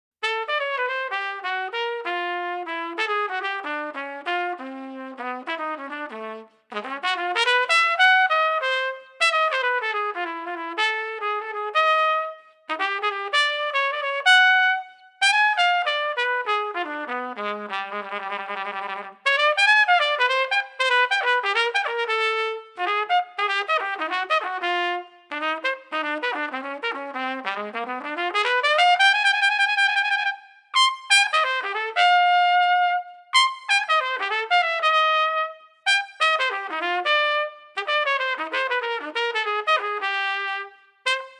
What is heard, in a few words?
Trumpet Skills